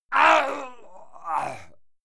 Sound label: male fight pain; soundpool